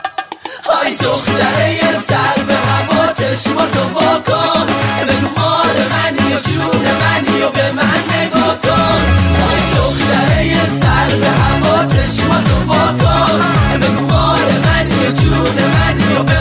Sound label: Persian Dance Music